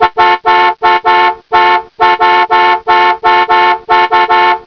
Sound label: klaxon